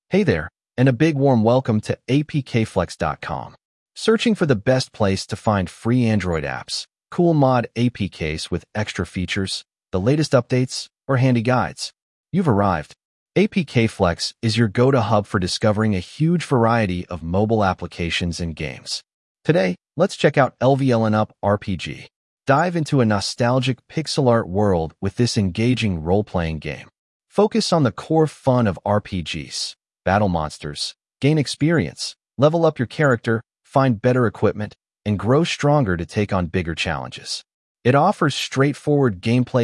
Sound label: Lvelup RPG